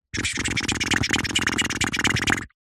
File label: human lip flicking fingers